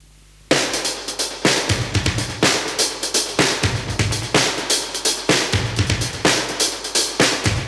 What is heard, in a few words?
125 Classic Breaks, 125